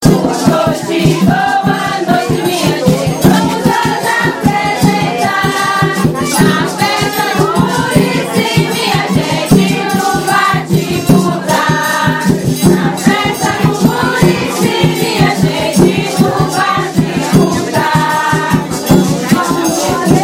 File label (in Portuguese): Cantos Festa; Muricí Batiputá